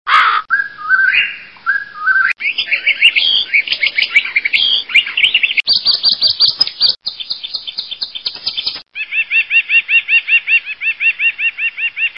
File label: bird chirp ringtone